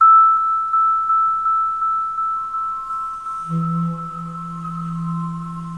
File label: vibe high note